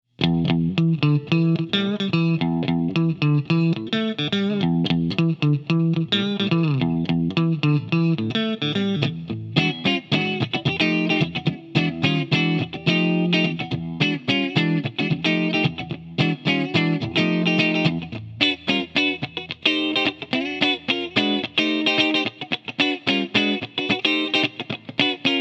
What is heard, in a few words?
Funky Clean Mid